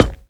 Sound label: ladder, player steps; quake2